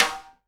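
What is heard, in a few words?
SIDE STICK, Live Snares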